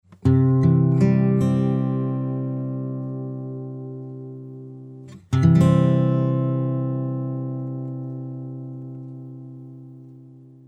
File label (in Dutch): GITAKKOORD